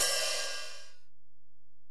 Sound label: HiHat, Alesis DM-PRO